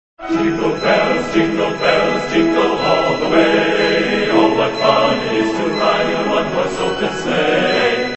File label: jingle men mono